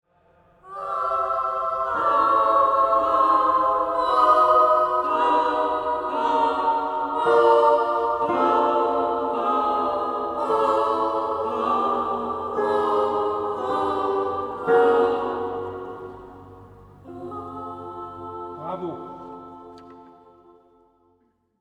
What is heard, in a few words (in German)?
Tutti